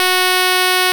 Pro 1 SQR F#4, Vintage Keyboards; Big Fish Audio; First Call

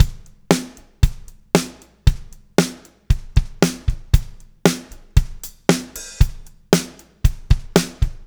MED POP, Backbeat, L

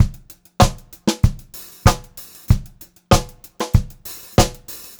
VARI, Pop-Rock Drumloops; USB Soundscan vol.37; AKAI

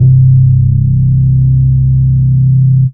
Single Electric Basses, Dance Floor Bass

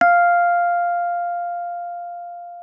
MK1 F5, RHODES; Xsample VOL-1

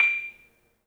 MARIMBA; 3D Sound; 3D Keys VOL-1

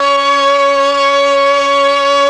STR, STRING

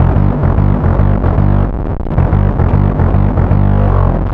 Bass, Distorted G; Bass X

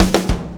BACKBONE, Backbeat

